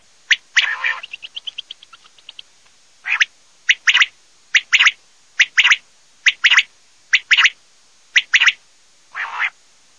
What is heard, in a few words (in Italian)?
QUAGLIA